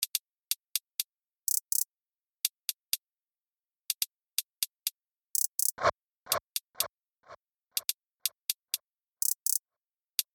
Sweep Hi Hat Loop 124 BPM

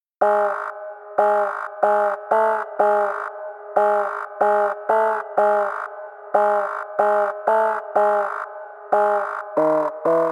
Cyber Bounce

